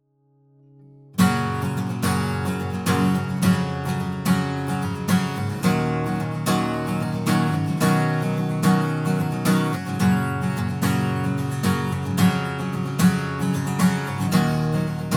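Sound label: guitar strum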